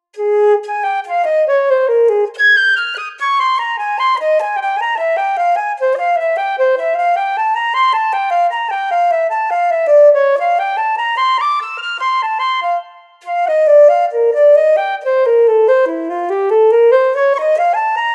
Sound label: shifting major scales flute